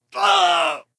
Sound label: Wound Crit; Valanar